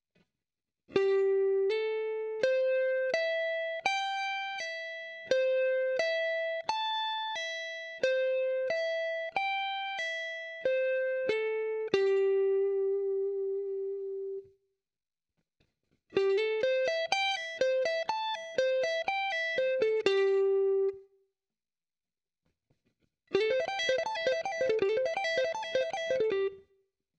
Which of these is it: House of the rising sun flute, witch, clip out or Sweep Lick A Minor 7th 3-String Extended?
Sweep Lick A Minor 7th 3-String Extended